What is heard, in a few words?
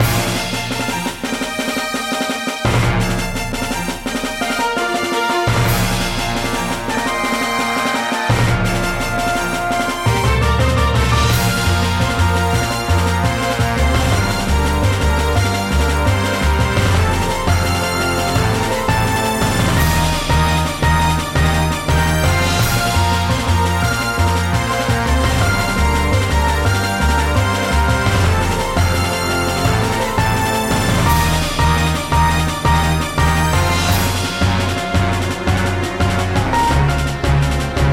event battle light